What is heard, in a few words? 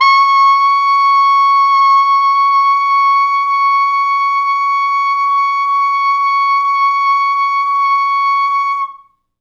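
T Sax ext. range, tenor sax; C#5; East West; Quantum Leap Horns Sax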